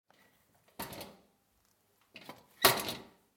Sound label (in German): Muelleimer